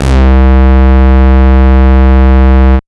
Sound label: Bass Note C; Best Service; House Definition VOL-1; Basses